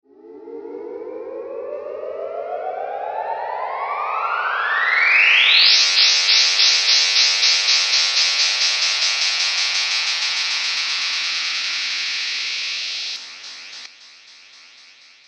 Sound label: self osc delay flanger